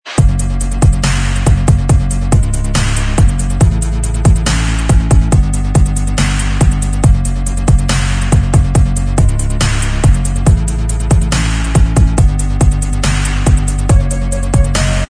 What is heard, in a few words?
Inst Track